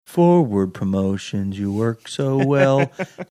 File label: singing